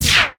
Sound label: Space Gun; Sci Fi; 1001 Sound Effects